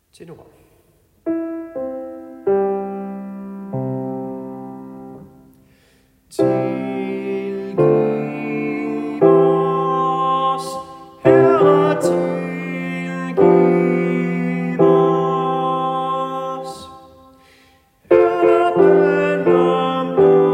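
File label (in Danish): Tilgiv os, tenor, folkekor; laudate kirkens folkekor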